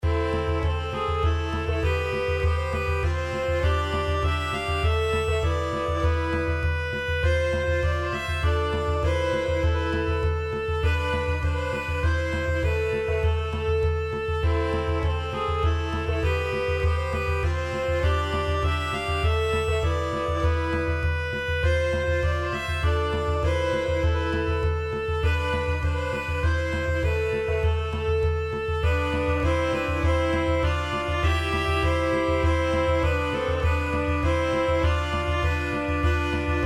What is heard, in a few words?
folkish drums